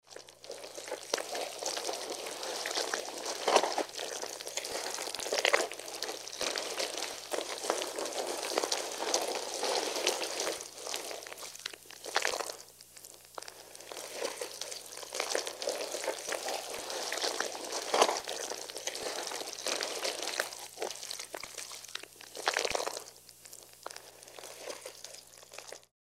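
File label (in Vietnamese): một con rắn bò qua những tảng đá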